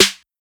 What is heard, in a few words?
snare orange; Hip-Hop; Drums; Drum One Shots; Slate; CAICOS Hip-Hop Sample Pack